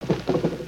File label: Chest Idle